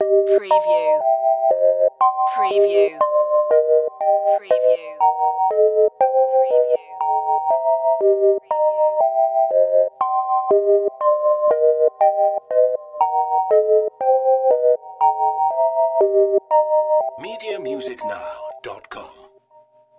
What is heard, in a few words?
Evil Melody